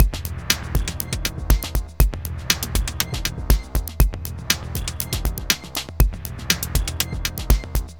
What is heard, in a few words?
Darkwave, Processed Percussion